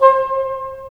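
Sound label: wind bassoon; LA Composer vol. 3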